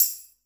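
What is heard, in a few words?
TAMB, drum machines; JV-1080 DRMS; EdgeSounds; Drum Mashines VOL-1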